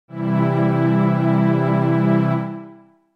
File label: E C Major